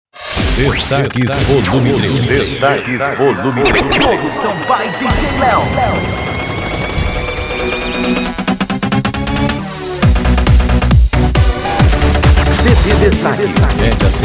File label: electro house e dance